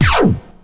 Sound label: RECORD STOP; pitchshifter PSI